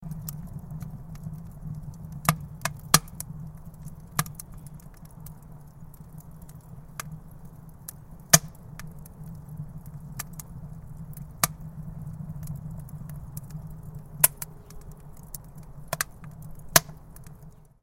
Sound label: chiminea fire